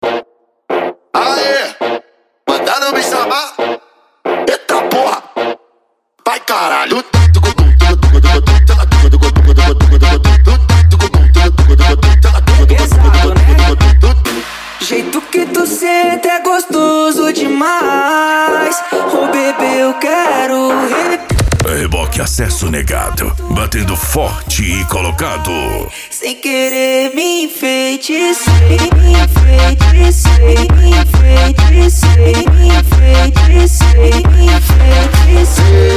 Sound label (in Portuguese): racha eletronica e funk mega bass